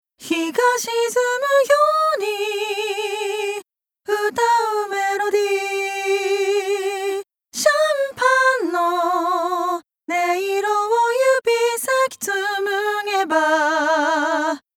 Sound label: Mix Cho